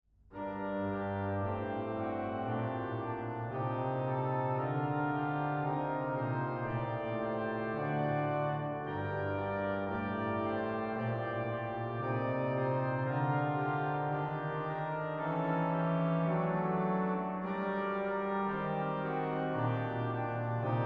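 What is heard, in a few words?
zwischenspiel in g minor, organist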